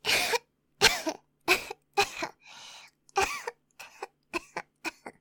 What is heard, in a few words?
coughs